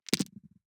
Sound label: DICE on Felt, Throw and Roll, Standard, 5 Five Dice; Samples; ArticulatedSounds; DICE Sfx Party (Extended)